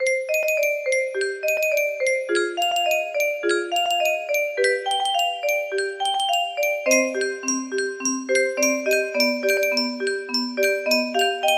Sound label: original music box